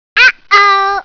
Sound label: death ohoh